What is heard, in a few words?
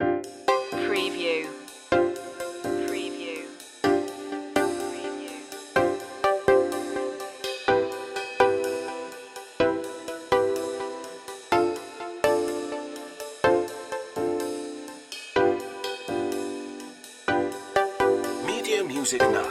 carefree day out loop